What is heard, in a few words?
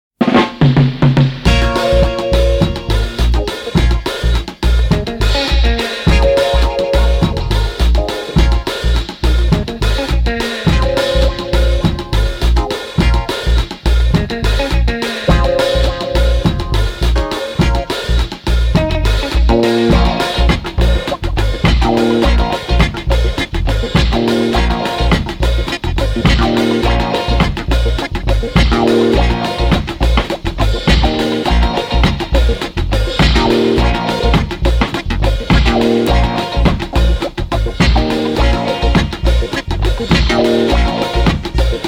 scratch